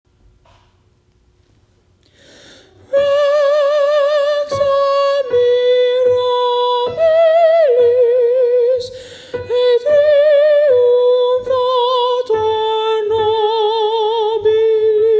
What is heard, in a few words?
morning hymn sop